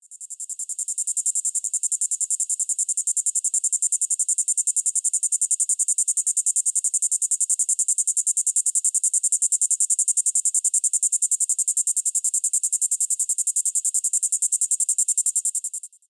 Diceroprocta viridifascia, cicadas songs; US; East of the 100th Meridian

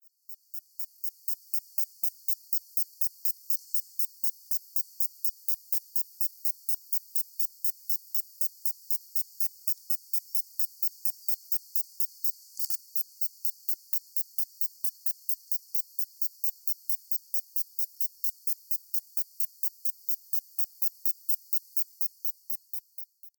Cicadettana kansa, cicadas, filtered; East of the 100th Meridian

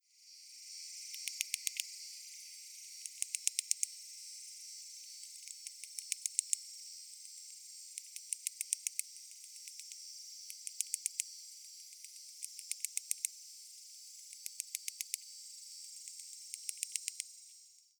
Platypedia putnami, cicadas, filtered; US; AZ; Arizona; Platypedia putnami (Uhler 1877)

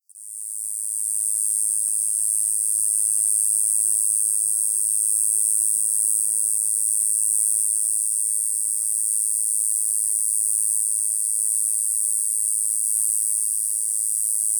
Diceroprocta olympusa, cicadas, filtered; FL; East of the 100th Meridian

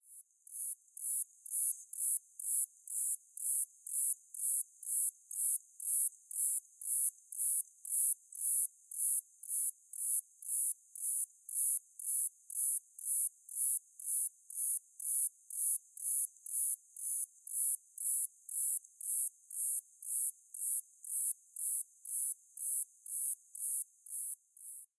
Okanagodes gracilis, cicadas songs; US; Okanagodes gracilis Davis, 1919

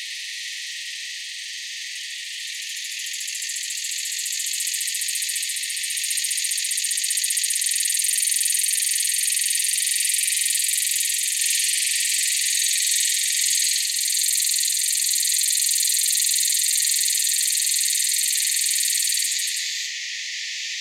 Neotibicen similaris similaris, cicadas; US; FL

